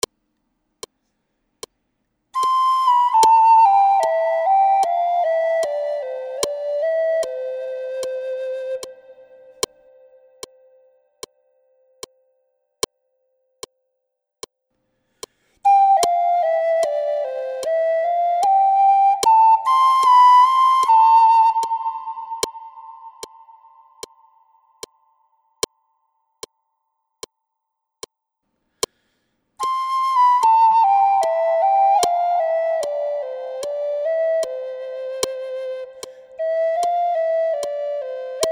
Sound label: Flute; Duets